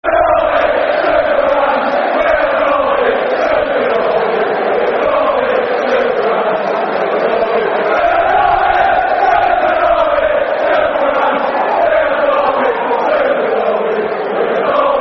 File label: We Are Derby Football Chants; Derby County Dcfc